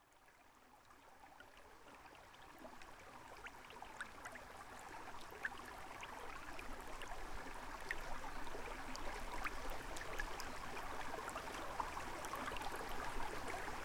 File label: runoff